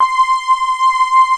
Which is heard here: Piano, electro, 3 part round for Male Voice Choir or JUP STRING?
JUP STRING